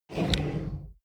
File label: plastic drawer large draw